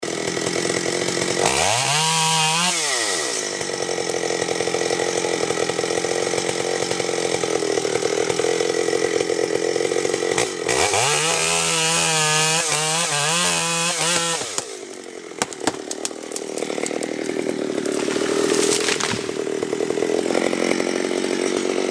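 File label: pilyat derevo benzopiloy, sawing wood with a chainsaw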